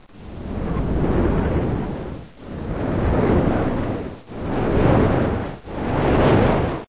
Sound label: fan on, fans; source engine; hl1; video games